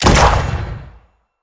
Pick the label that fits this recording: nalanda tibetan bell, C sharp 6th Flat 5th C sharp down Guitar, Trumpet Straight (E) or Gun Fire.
Gun Fire